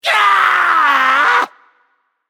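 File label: non-verbal battlecry; smite; voicepack; jingwei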